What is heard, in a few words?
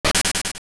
Bush; A bush destroyed